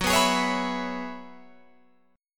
F sharp 7th Suspended 2nd Suspended 4th, Guitar, Open F; F#7sus2sus4 strummed